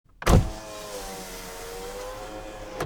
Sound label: window open